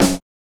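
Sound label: snare drum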